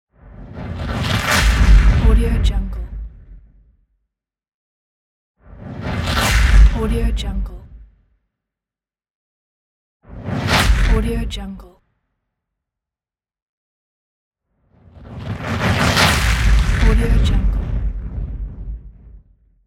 fireball; applehillstudios

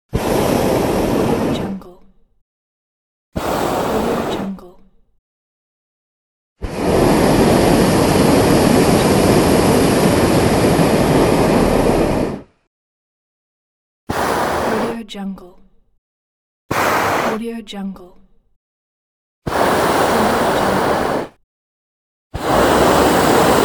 hot air balloon

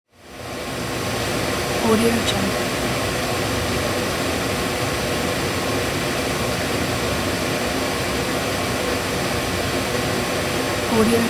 large boiler heater; applehillstudios

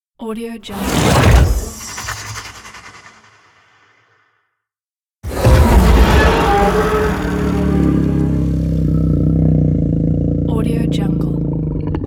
the monster

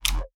ship set scroll; soundmorph

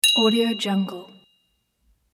wineglass ding

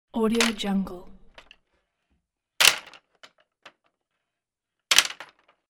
folding wooden garden chairs

